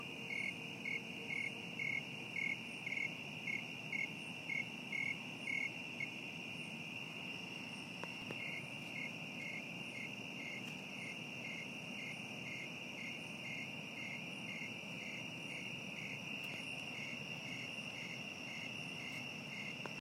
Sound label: Snowy Tree Cricket